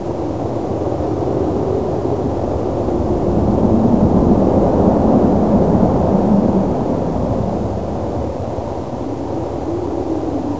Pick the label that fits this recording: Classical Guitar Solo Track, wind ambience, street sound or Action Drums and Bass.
wind ambience